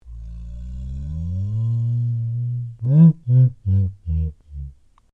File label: Created Creature Large Laugh, Alien Sound Effects; Created creatures, vocalisations and pretend speech